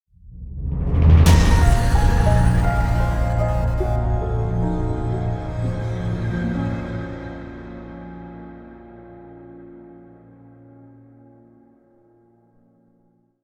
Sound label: Dark Logo